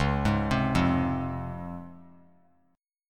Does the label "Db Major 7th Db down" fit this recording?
Yes